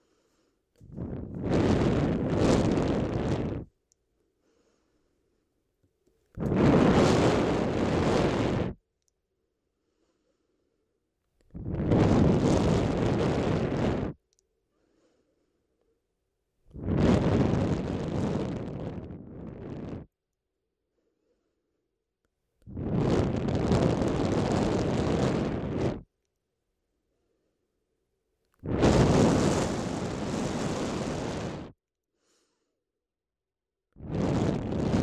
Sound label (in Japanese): 右耳 女性が強く息を吹きかける音ASMR; 快楽音フェチASMR 両耳元で強く息を吹きかける素人女性の音